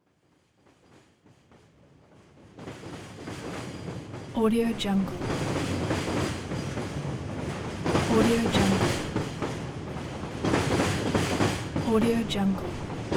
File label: train